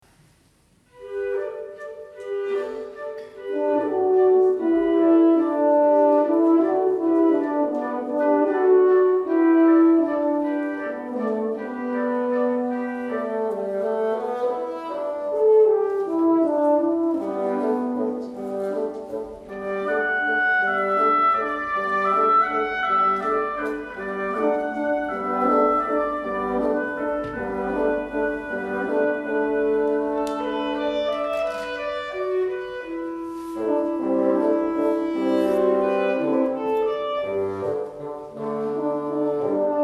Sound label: Waltz, wind